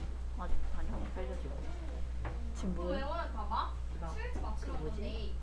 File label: beat